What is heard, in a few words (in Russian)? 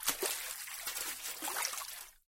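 всплеск плавной теплой волны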